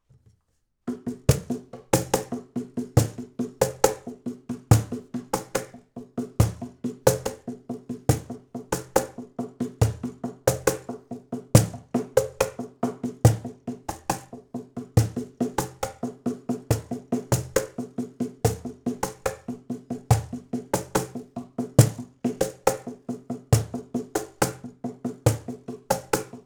cajon; logic

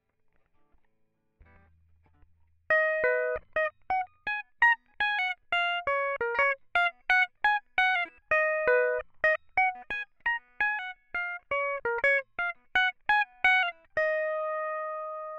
plucking; logic

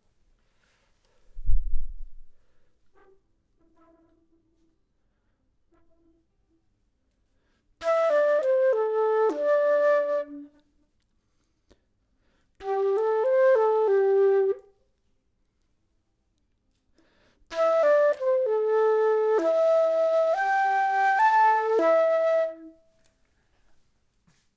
flute

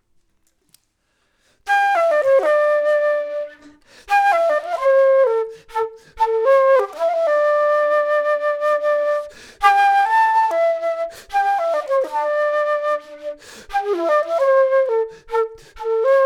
flute; logic

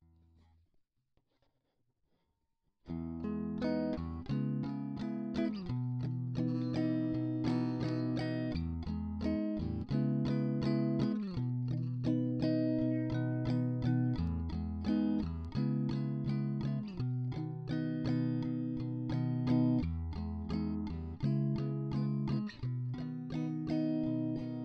Amazing Tweed; logic

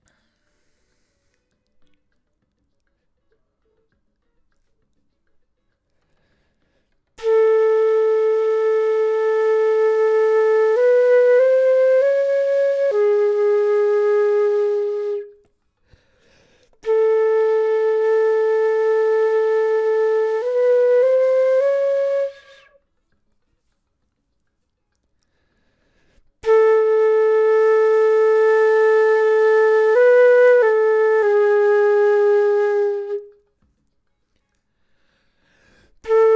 flute; logic

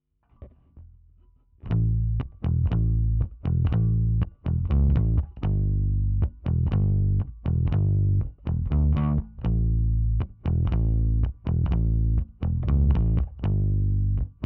bass pick; logic

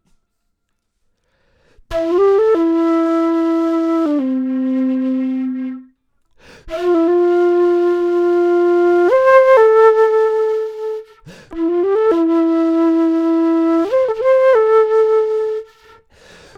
flute; logic

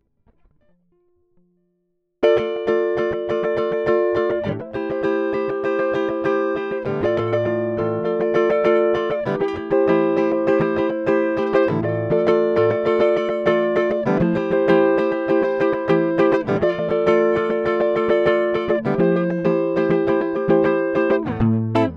Small Bright Combo; logic

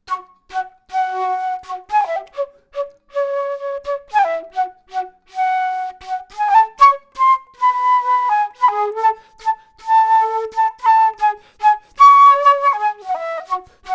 flute intro; logic

